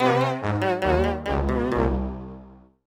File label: Mini Game Lose; new super mario brothers